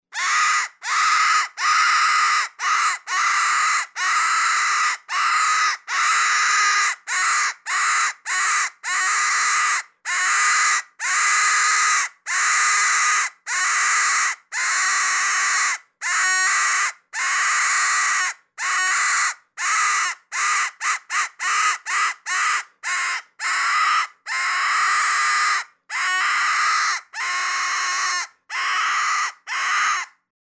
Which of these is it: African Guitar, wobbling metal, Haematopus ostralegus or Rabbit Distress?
Rabbit Distress